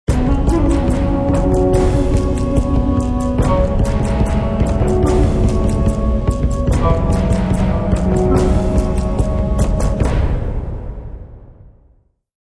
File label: Ancient Combat; Civilization Revolution; Xbox